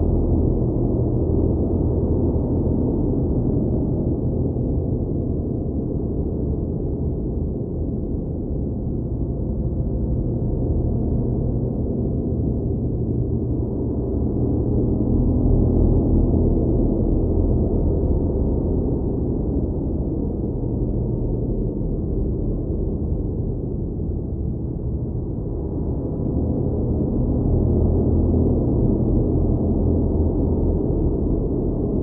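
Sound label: SPACE STATION AMBIANCE; CRAZY SCI FI AUDIO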